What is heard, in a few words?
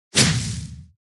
arrow spawn; Blue slush cookie